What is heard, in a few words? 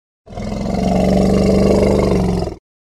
Elephant Growl Snarl